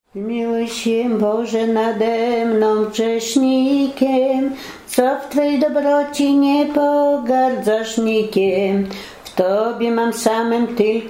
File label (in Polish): pieśń pogrzebowa